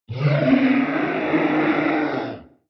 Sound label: Dino Death